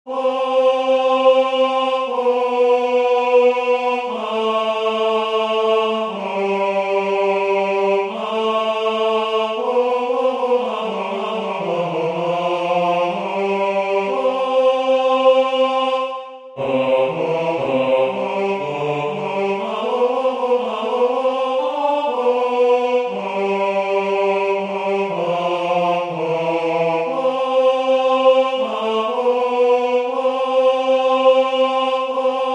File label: Alleluia Bass, choir; Boyce